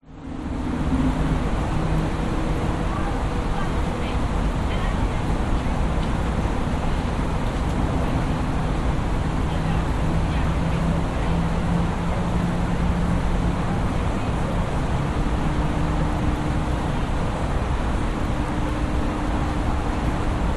public places city riverside night quiet distant traffic hum faint voice close by